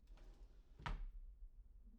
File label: drawer; IEEE AASP